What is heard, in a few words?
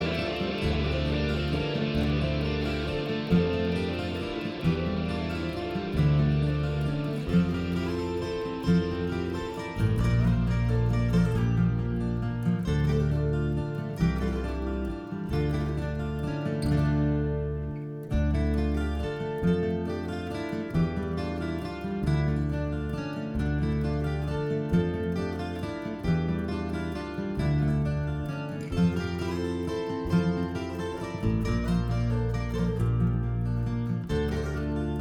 acoustic solo